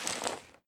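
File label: foliage footstep; adams venture origins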